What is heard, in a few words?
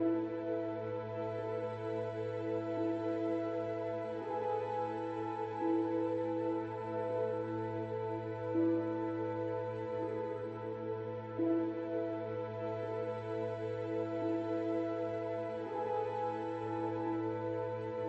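Music loop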